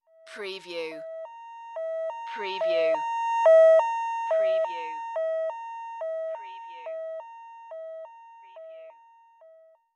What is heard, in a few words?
Fire Truck Siren, Pass By; Vehicle / Transport Sounds / Lorry / Truck Sounds